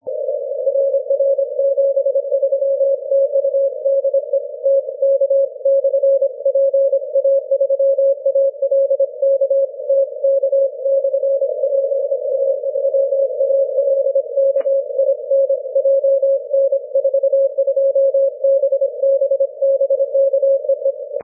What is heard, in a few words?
6m cw